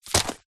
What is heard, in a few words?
magazine cheap set down carpet; household; zapsplat